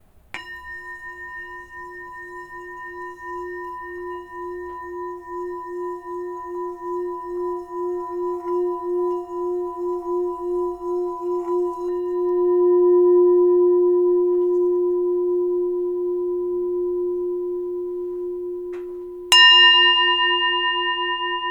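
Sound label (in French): bol bengale 5-600g Mi, Bol chantant; Gros bâton cuir bol chantant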